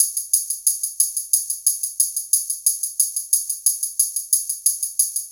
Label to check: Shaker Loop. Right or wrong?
Right